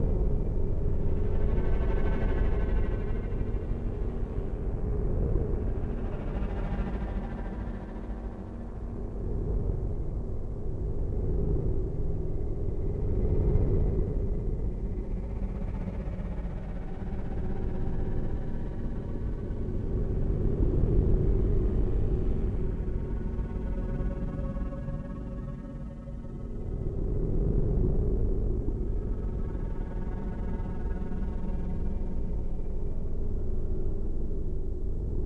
drone

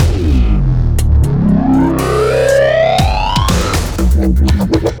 Taunt; Evos

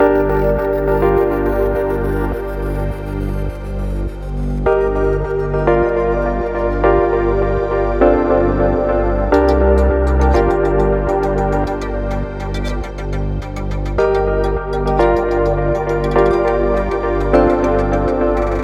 Race Lose Sting Loop

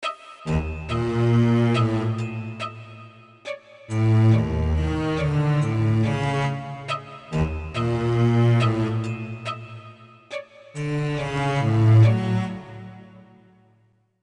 Background Music

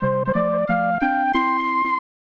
Unused Jingle; Block Kuzushi; SNES

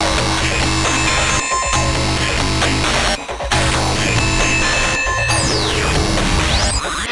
Alien Battle; Lego Battles 2009 NDS

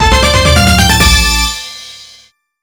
Goal

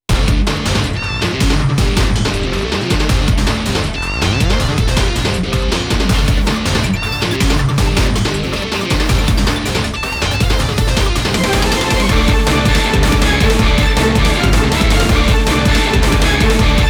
Hitting March; Family Stadium 2020

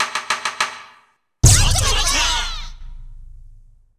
SFX After Loading Save Games; Shiritsu Justice Gakuen Nekketsu Seisyun Nikki 2; PlayStation gamerip

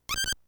Splat!; lemmings nes 1992

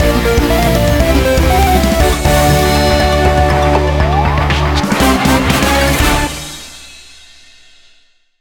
Lab Completed; Jetpack Joyride 2

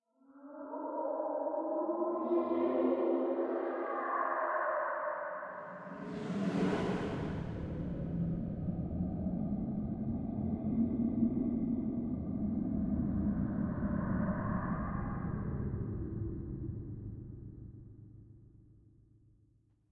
Eerie Distant; silent hill homecoming 2008 gamerip pc ps3 x360

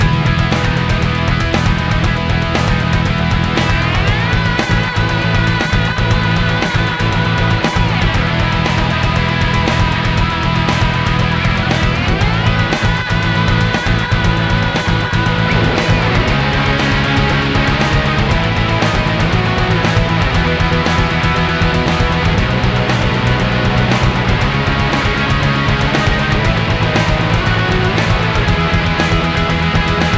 BGM Menu; deer drive 2010 nds

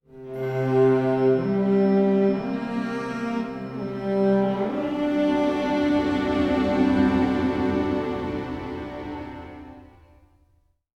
Success Chime Major; The Eyes of Ara

